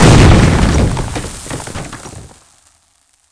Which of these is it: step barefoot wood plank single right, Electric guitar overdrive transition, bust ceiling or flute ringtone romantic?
bust ceiling